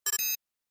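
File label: Flash Beep Double Beep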